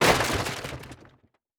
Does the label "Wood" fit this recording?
Yes